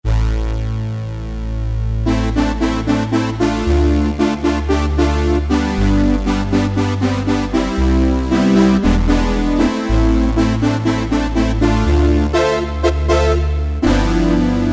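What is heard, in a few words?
Brass Power, Yamaha TG33; Factory Presets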